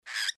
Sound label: cockatiel